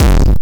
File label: Elekt E Kick; Yamaha PSR E305